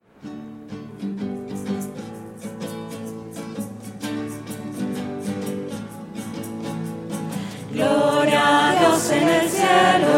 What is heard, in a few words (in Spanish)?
voces; misas 2012